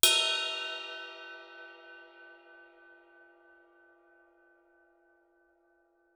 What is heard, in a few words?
ride stick bell ff stereo, Suspended Cymbals; Percussion